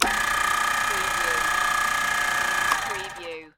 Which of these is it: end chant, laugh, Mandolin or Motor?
Motor